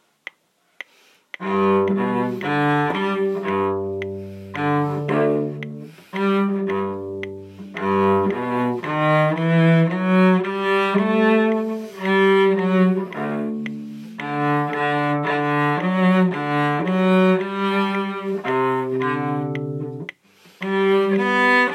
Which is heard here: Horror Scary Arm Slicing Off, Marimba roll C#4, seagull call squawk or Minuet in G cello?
Minuet in G cello